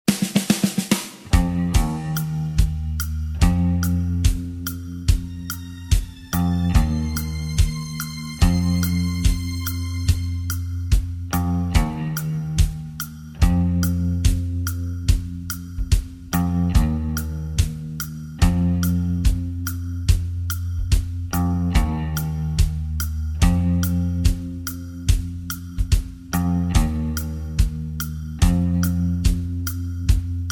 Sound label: F No Backing Vocals, backing tracks